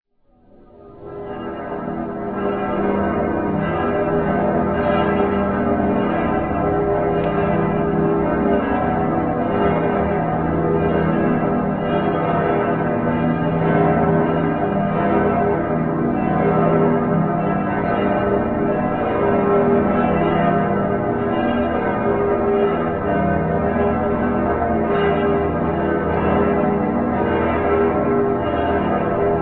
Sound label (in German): glockengelaut der dresdner kreuzkirche